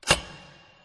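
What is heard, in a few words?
Ui Cancel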